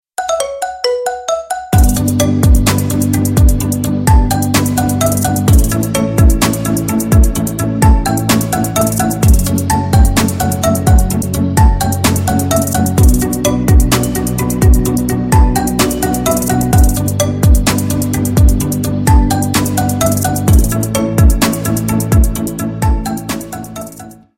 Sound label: marimba remix